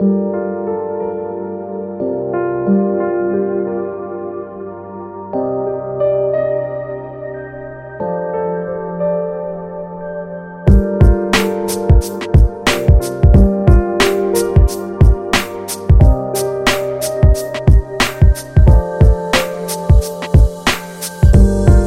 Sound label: Lounge